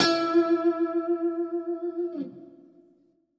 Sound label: DEEPHOUSE GUITAR 125 A